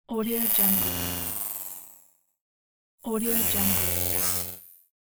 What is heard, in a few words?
hitech scanner; aetherfx